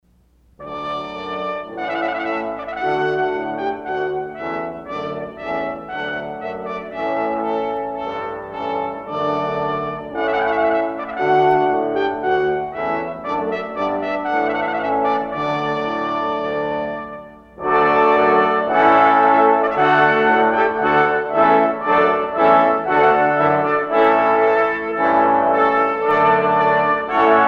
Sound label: Trumpet Voluntary Brass Ensemble